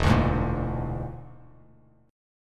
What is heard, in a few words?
E 7th Flat 5th, down, Bass Standard; E7b5 strummed; JGuitar